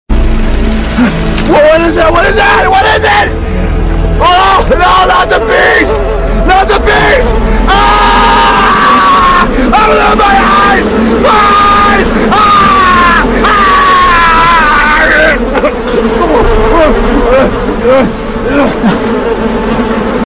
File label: bees